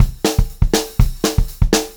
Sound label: 120 SPEED, Pop-Rock Drumloops; USB Soundscan vol.37; AKAI; 90 s Sample CDs